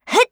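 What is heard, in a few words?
attack, Voice Line, Korean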